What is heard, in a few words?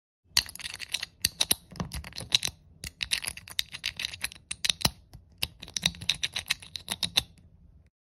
Lego pieces